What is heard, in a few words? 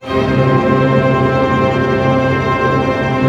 TREM C FF, ORCH TREM; Sound On Sound - Peter Siedleczek's Orchestra